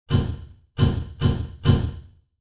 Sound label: Urgent Impacts